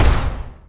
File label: metal door full close; doors